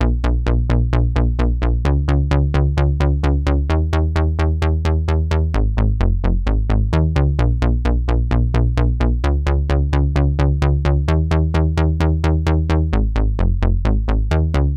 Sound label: Synth Bass